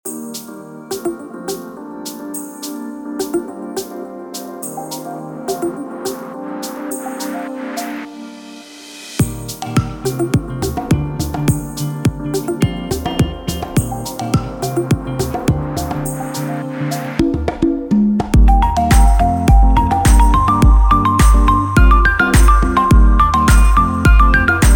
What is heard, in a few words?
Theme Song Extended Natural End; Cargill